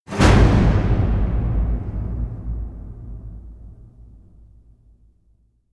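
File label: hit swoosh; gotcha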